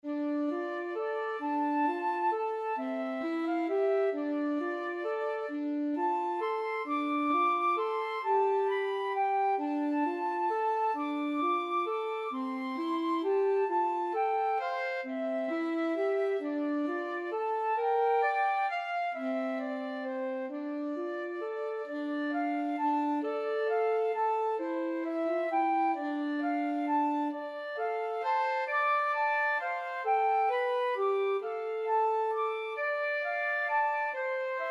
flute saxophone duet

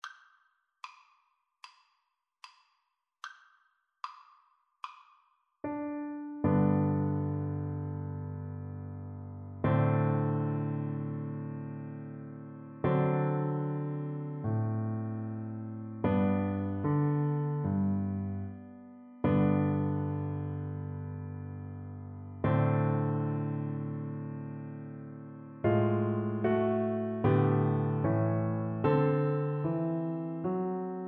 America, Double Bass

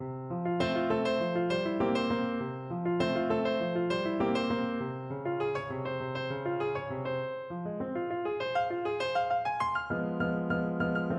rag, piano; maple leaf

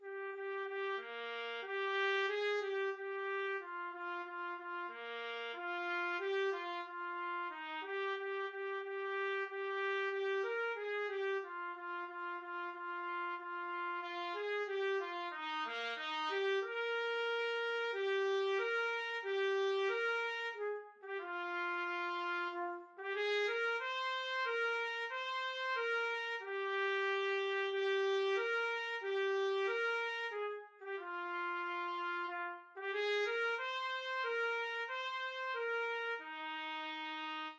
un petit navre trumpet

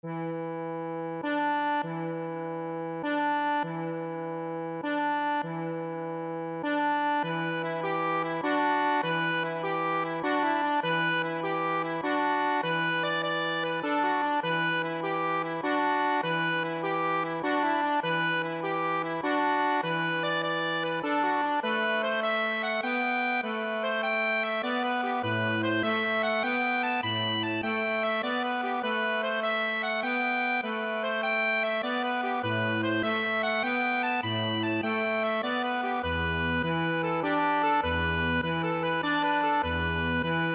accordion

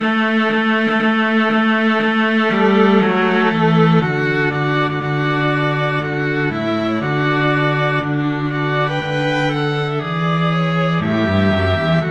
wedding march, string trio

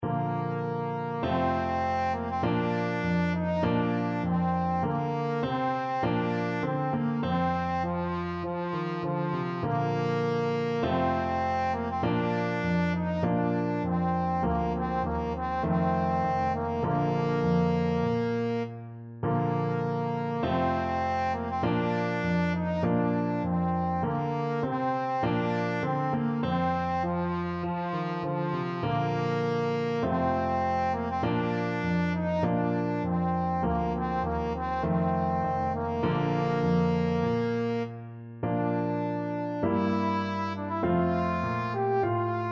trumpet voluntary, trombone

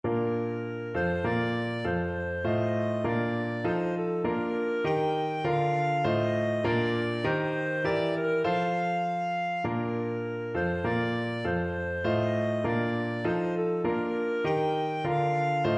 clarinet; german nat anth

